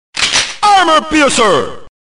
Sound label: Armor Piercing